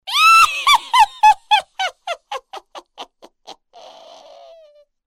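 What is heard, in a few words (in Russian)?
старенькая ведьмочка шалит; Для Хэллоуина; звук смеха ведьмы; Коварный злой смех старой ведьмы, забавный смех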